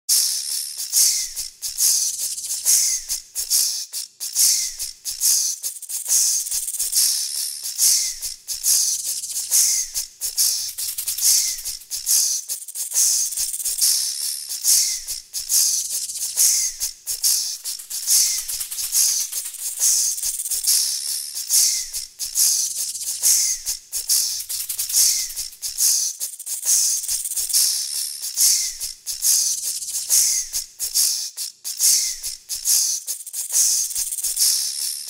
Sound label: Snake Jazz; Entertainment